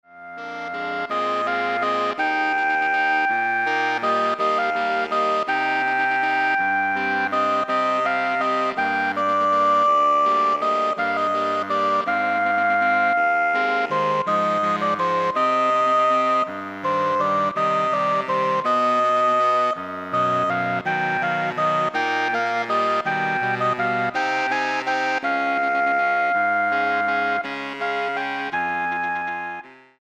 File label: Saxophone Ensemble